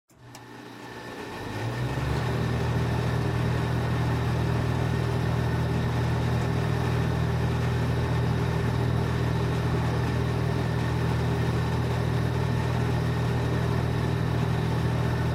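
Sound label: sounds of welding machine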